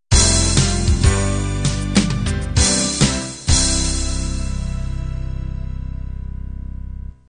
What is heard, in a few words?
home beat end